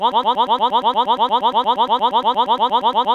Sci Fi Loop

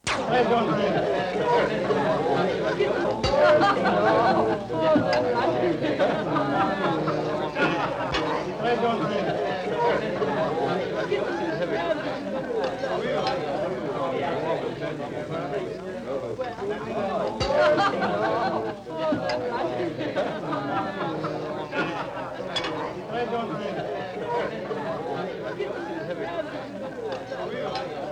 Dinner Party Or Restaurant

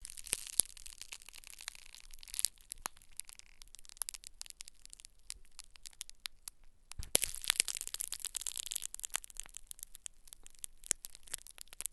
Pop Rocks Plastic Stretching

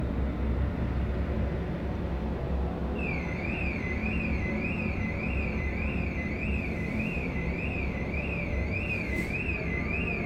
Car Alarm

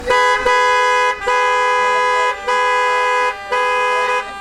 Car Claxons

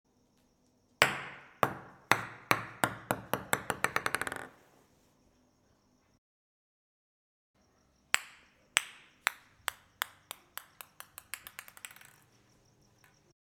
Ping Pong Ball

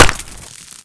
Bullet Impact

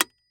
Metronome Tick Bellish

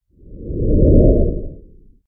Slowly Whoosh

